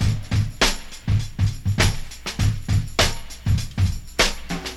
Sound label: 100 bpm drum loop sample c sharp key